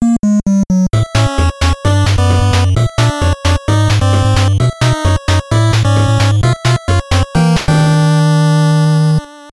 Continue Screen; Sonic the Hedgehog NES

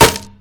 Wood Box Impact Bullet; Physics; Counter-Strike: Global Offensive

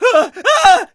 Scared Male; friday the 13th killer puzzle

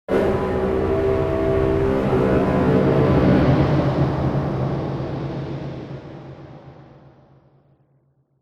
Music Stinger; HDF Ch2

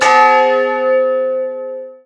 Old Clock

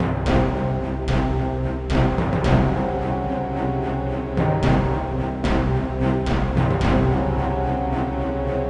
sounds music; Halo Combat Evolved; Xbox

